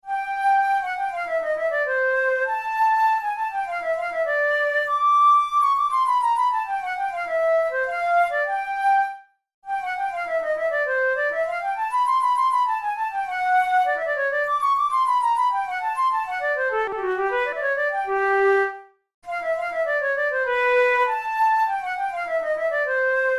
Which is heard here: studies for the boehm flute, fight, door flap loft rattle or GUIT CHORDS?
studies for the boehm flute